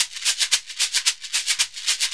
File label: SHEKERE, Synclavier Percussion; ILIO